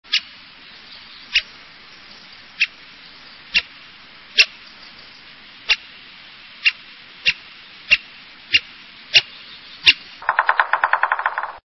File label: American Three toed Woodpecker